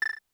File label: cursor; keyboard sound thing for kitty